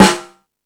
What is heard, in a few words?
Jazz Drums, E-MU Pro-Cussion; drum machine